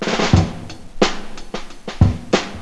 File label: drum